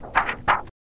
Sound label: Paper Flex Free Air